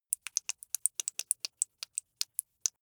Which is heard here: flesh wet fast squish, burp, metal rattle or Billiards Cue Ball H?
flesh wet fast squish